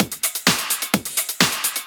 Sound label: Clubhouse Loop 128 BPM